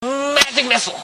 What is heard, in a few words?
magic missile; the game